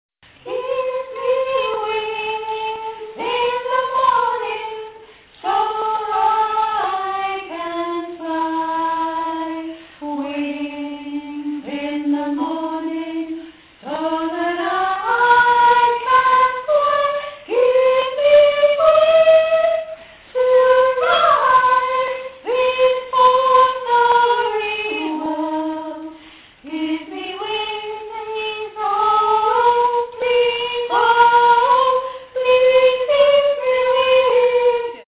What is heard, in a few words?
soprano